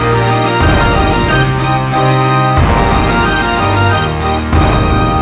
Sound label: Canon Loop